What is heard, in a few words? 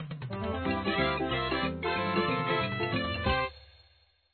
Minigame Theme; papas cupcakeria online windows gamerip 2013